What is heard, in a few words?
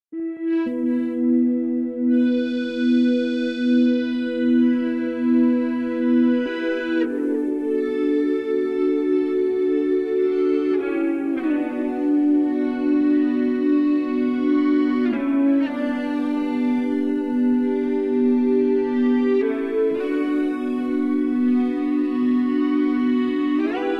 Ebow Chorus